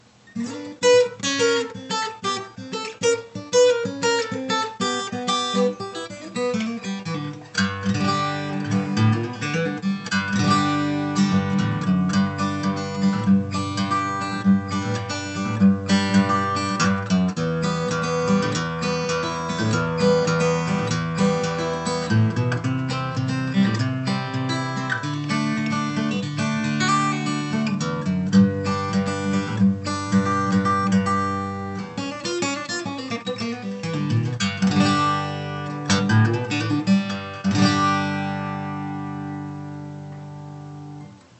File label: finger picking L 00